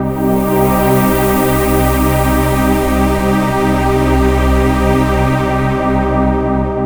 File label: Fat Pad